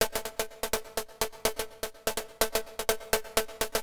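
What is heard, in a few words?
percussion, house, 125, drum; eJay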